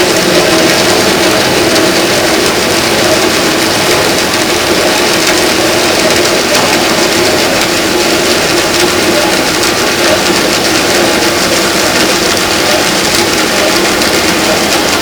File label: Hydrophones, orcasound